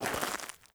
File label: FOOTSTEP Walk Trainers Compact Gravel Slow; mono